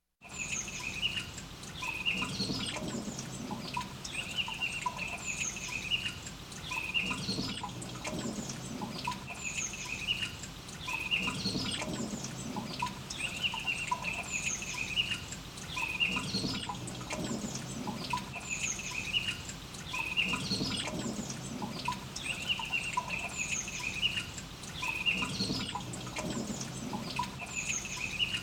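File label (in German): nach dem sturm, Geraeusche, Suedsee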